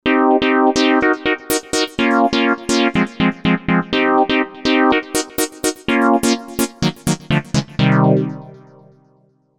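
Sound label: Lead Minor Synth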